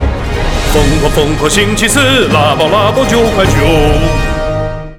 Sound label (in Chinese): 唱歌; KFC